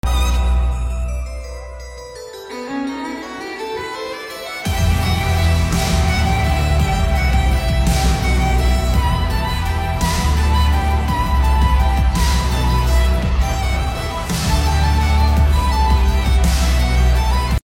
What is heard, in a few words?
violin; carol of the bells